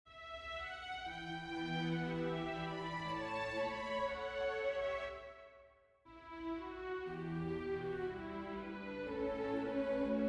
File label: aria for oboe strings; Rimsky-Korsakov, Nikolai; Sadko